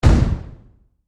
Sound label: Horror Magic Poof Flash Powder Impact